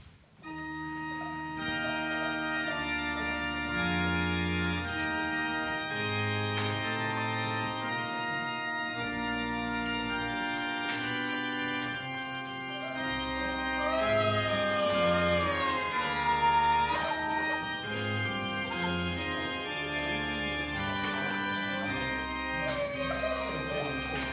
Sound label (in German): Orgelausgang